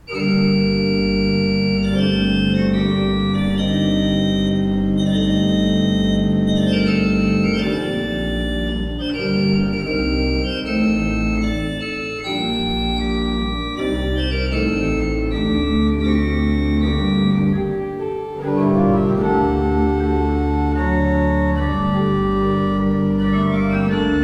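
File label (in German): Merkensteiner Orgelbau 19 II P, pfeifenorgeln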